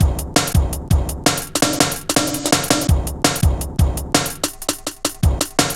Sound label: Bouncy, Jungle, Drum Loops 166.5